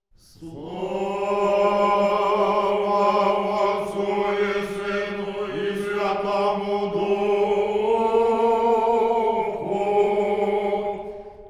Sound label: Hymn To Christ; Only Son And Word Of God